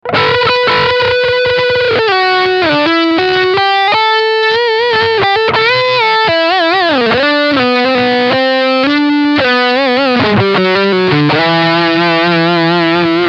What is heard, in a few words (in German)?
esp ltd ec-401 lead hals pickup